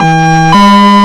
hilo, sirens; federal sig rumbler

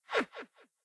Fade; blue archive

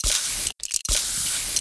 Spray; blue archive

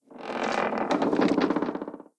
Clatter; blue archive

